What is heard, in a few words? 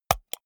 computer mouse wheel click hard